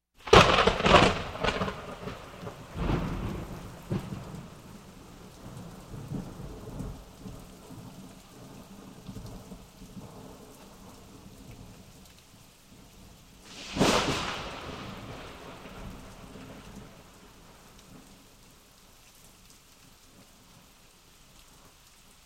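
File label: storm and thunderstorm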